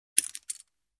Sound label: Poker Chips